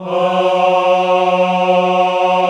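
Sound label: choir F#2, Mmhh; Sound & Vision - Gigapack I CD 2 (Roland); Men-Combi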